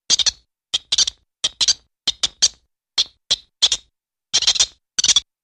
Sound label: Marmot Or Small Animal; animals